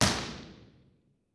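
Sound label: impulse response stereo; spring lane building university york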